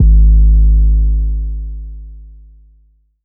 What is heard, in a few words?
808, Bass